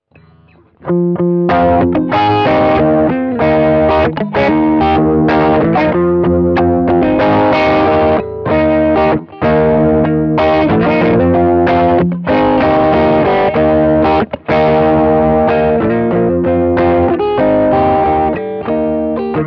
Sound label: Germanium